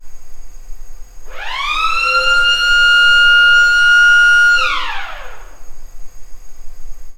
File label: sirene